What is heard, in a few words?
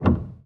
piano release